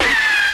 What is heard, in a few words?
ah jazzy; ooh ahh